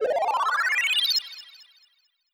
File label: heal long low